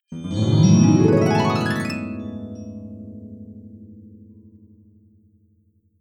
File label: sfx magic